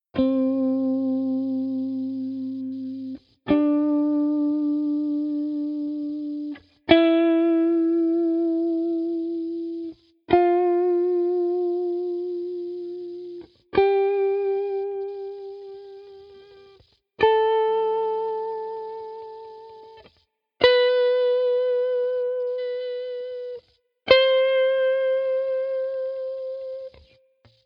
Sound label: scale example; C Major played with vibrato